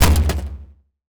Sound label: car contact; ludumdare40